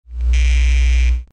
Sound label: D tinctorius, chants